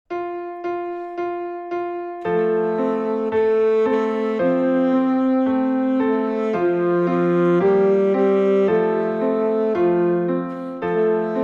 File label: Alto Sax French Children's Song; Suzuki Volume 1